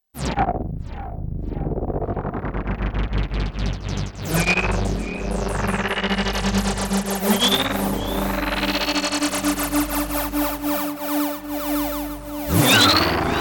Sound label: Nina Warp Drive FX; Melbourne